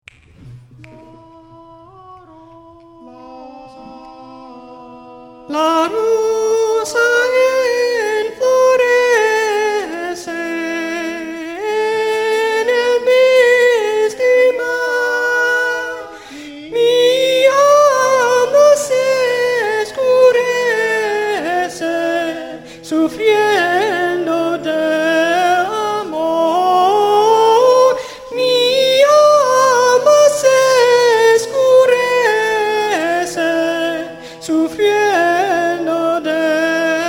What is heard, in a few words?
la rosa sopranos